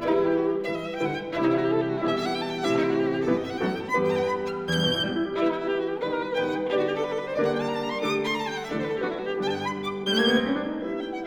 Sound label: Variations for flute & piano in E minor